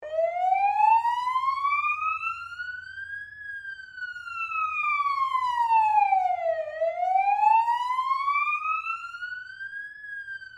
SIREN POLICE AUSTRALIAN START STOP EXTERNAL STEREO, Australian emergency service sirens; Siren Sound Effects